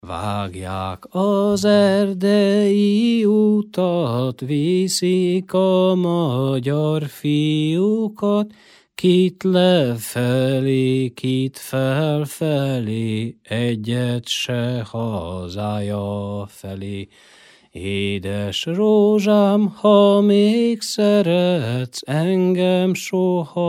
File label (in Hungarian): nepdal; vagjak az erdeti utat; nepdalok